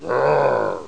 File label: roar